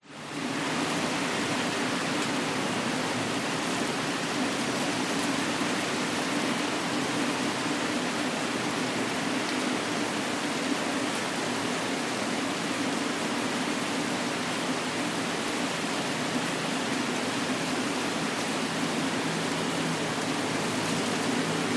nature rain heavy city thailand chiangmai light traffic bg condo